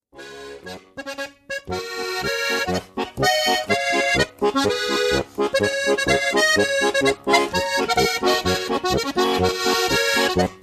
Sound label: Tiroler Holzhackerbuam Steirische